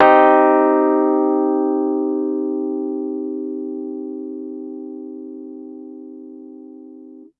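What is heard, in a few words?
Piano Rhodes minor hard; TrashLibrary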